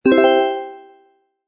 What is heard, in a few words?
points; fraction robot collector